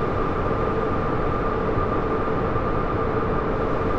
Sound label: InfiniVerb Noise, sparse soundscape Loops